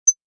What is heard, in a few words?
Multimedia, Beep, Button, Menu, Rollover, Web Page Or Flash Animation Sound